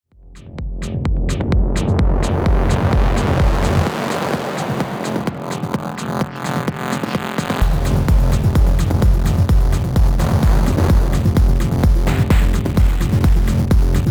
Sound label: Denon DJ X1850 BitCrush